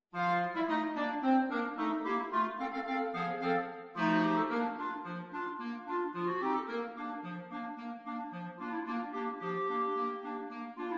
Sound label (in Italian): quartetti